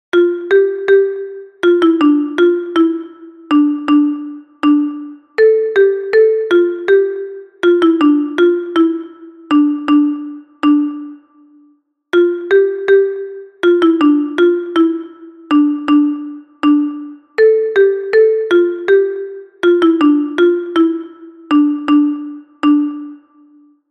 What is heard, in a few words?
kalimba